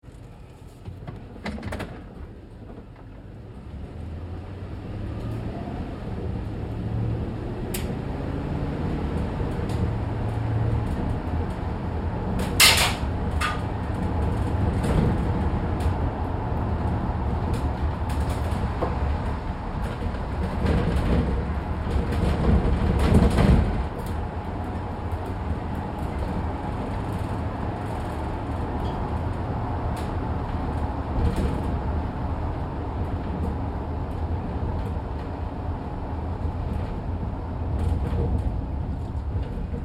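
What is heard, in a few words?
Truck GMC Savana Interior Drive Corners Med Fast; 2010 GMC Savana; Big Room Sound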